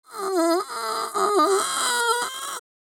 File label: Pooping vocalization grunting while pooping